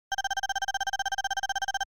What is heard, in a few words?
SNES Shooter Score